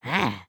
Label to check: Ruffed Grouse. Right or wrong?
Wrong